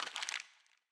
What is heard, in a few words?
Crumpled Paper